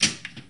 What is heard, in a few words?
Pinball Flipper